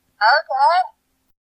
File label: Wiimote Sound; Ness